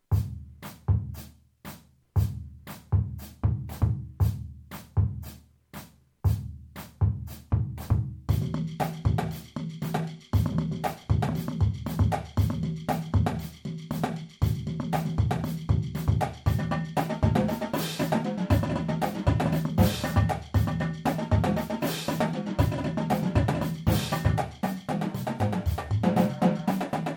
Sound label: striker groove